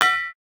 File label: bounce; midnight guns; gpistol